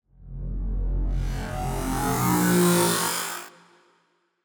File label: Sound Design Mech SFX